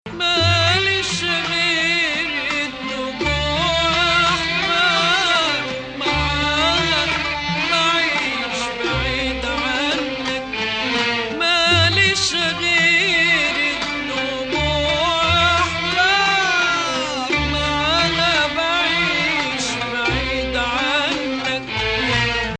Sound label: Nahawand - Bayati